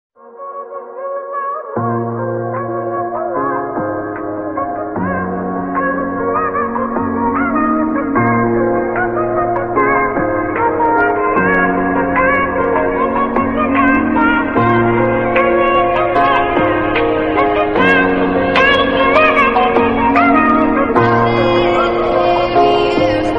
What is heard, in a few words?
Remix Bgm Ringtone; ringtones